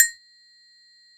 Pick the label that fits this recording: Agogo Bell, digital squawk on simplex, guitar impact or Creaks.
Agogo Bell